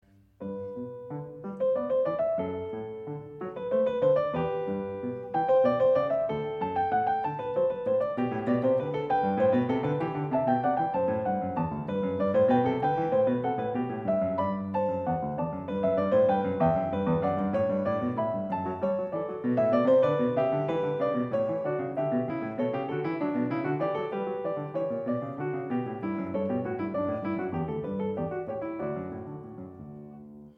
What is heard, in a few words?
Bach Goldberg Variations a 1 Clav Canone all'Unisono, PIANISTS